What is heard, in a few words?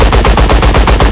aa machine gun big loop